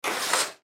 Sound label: Window Slide Open; Window Slide Open Fast; Blastwave; Household